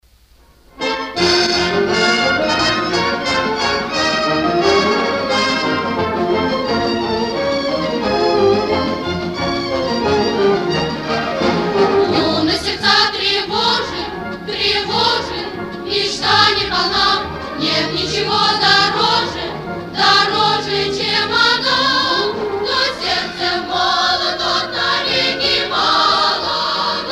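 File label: piano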